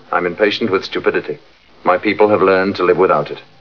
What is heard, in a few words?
impatient